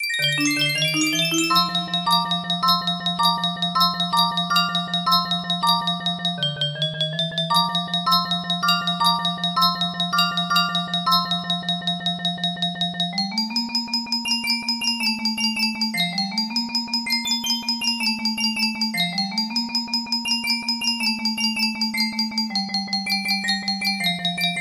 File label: music box edition; sega green hill zone